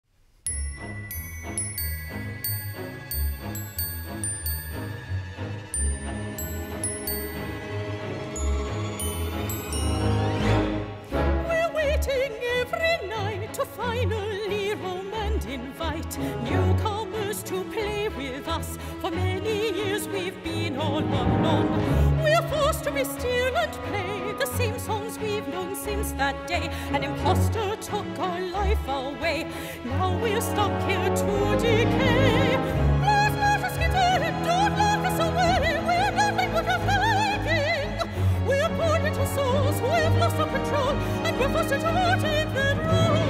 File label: orchestral; fnaf 10th anniversary